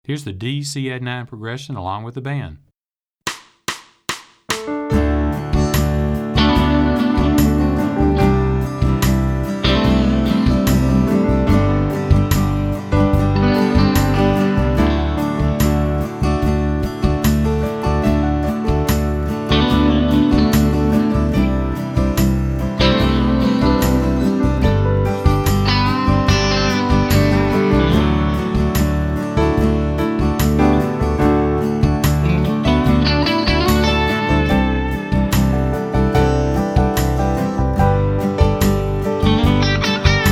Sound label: D Cadd9 Progression Fast